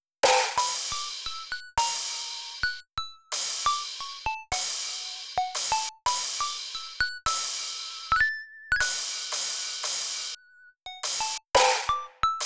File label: Mixdown Cymbal Snare; クラッシュシンバル、あとはメインとは別で強調されたスネアなどですね。; 初手のスネアでビビりますねこれ